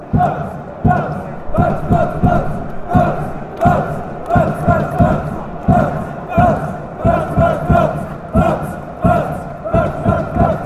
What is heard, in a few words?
bounce; stevenage